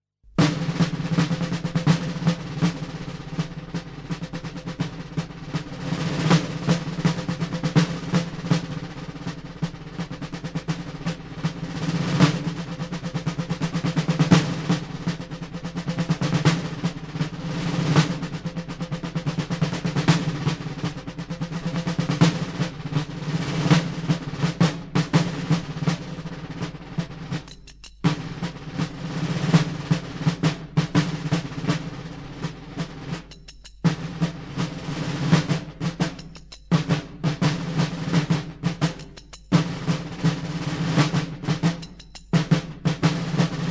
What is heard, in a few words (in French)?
Rigaudon Honneur; Napoleon; hymnes Napoleon